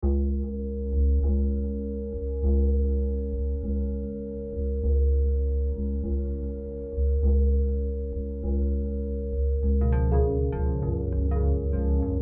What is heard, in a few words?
Music for cyclic underwater movement